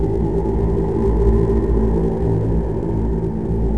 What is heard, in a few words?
chant; The Elder Scrolls III Morrowind GOTY; XBOX